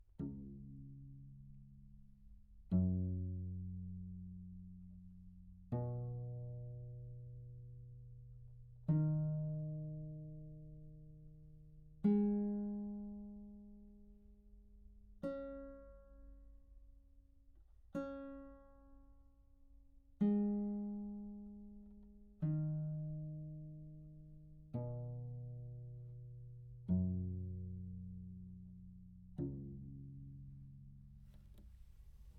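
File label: bass viol back mic pizz arpeggio, Anechoic; OPENAIR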